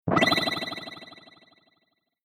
Future Telemetry Low Glassy Shimmer Computer Beep Interface Delayed Space; Sound Design; Computer Sound Effects